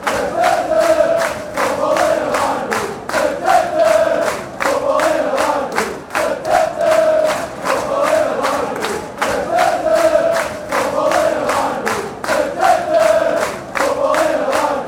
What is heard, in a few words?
football in a library, chants